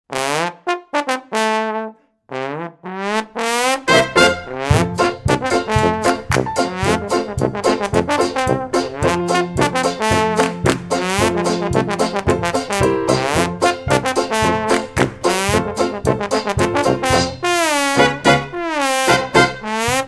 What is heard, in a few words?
trombone